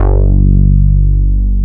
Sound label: CL BASS; East West - Global Sounds; THE CAT